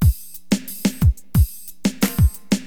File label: Drum loop, HipHop; Drums; LiveAct Pool